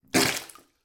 human spit bath water from mouth

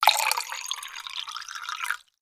vodka pour from bottle into glass

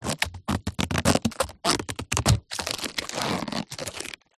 foley rubber thin elastic stretch stress; zapsplat

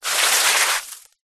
wet sand hand dig in; foley; zapsplat

